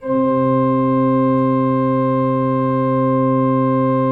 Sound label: C2, Cathedral Organ